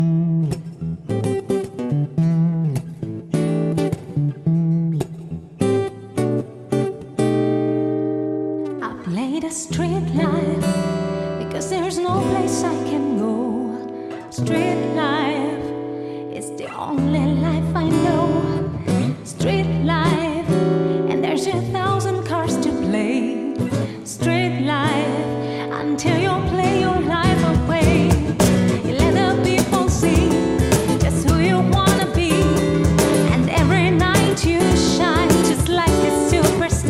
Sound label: vocalist wedding